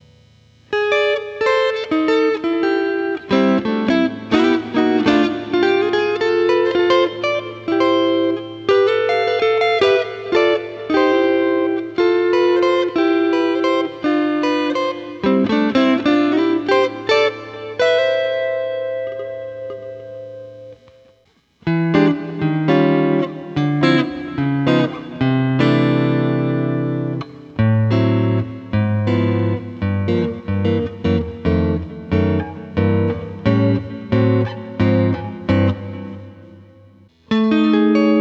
Guitar Delay

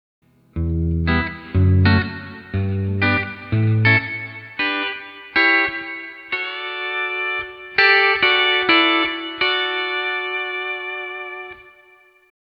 D13 Tab Pedal 8 Walkup; D13 Copedant Tabs

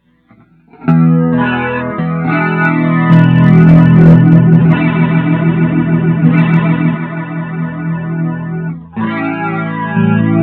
JAZZ ORGAN, Boss GT-8

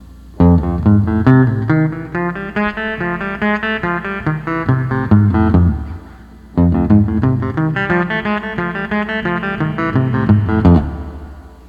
12 String Lap

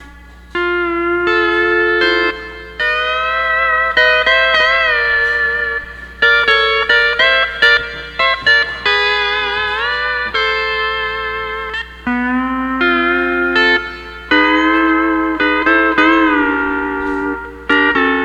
12 String Lap

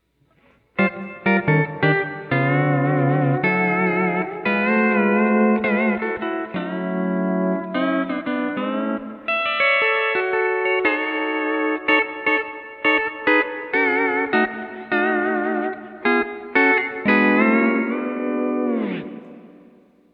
12 String Keyless, Steel; Excel

